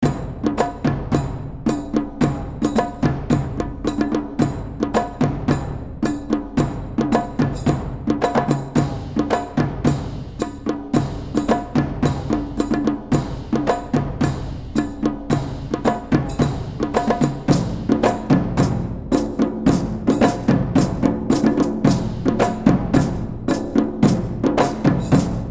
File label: Tribal Drum Corps